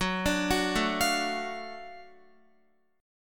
F sharp Minor Major 7th Sharp 5th F sharp down Guitar; arpeggiated